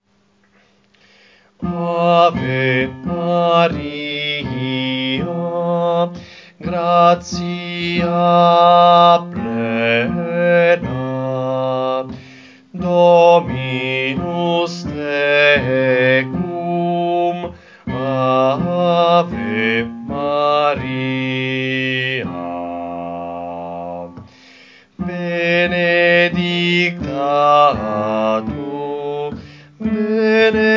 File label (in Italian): Ave Maria Arcadelt Bassi; Coro Isola del Giglio; Ave Maria Arcadel 3^